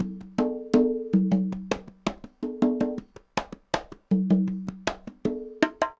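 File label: conga bongos, latin, 80bpm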